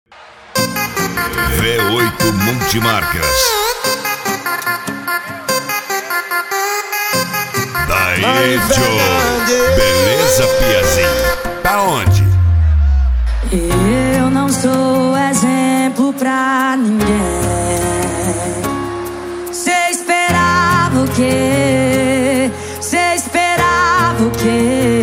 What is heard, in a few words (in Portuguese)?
sertanejo; v8 multimarcas